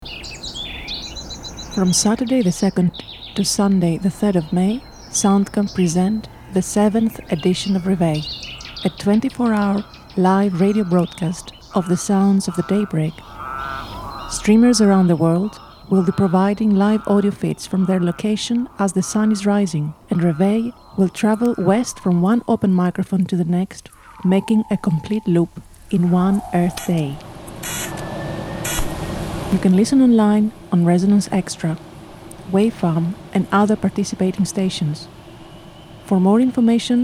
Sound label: jingle; Reveil 2020